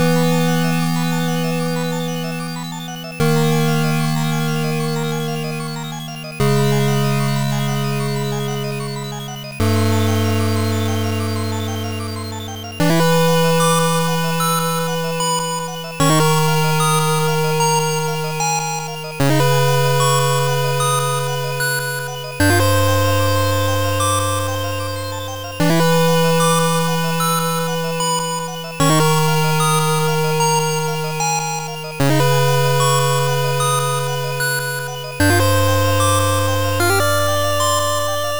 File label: 8bit